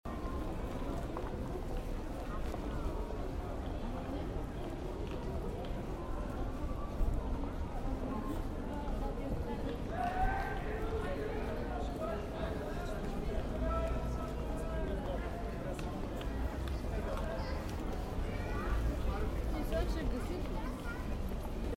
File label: vitosha ambience